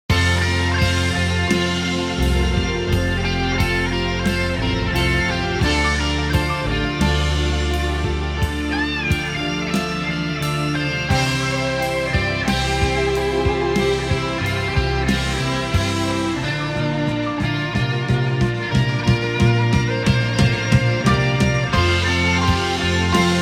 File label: metronome; finale